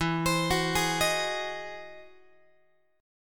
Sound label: E Augmented Major 9th, Guitar; arpeggiated; E+M9; JGuitar